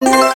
bonus symbol win; games; Book Of Fortune

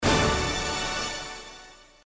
circus scatter win; games; Crazy Circus

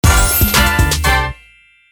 free spin init; Red Chilli

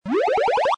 gamble win; American Poker The Legends II

